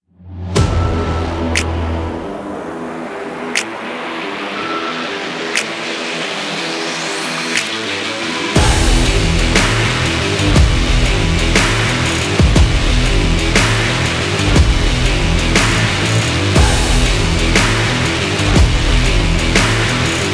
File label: energetic sport rock music